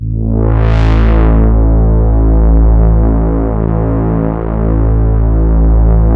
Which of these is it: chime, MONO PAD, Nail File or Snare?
MONO PAD